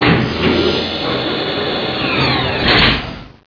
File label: S-foil; XWing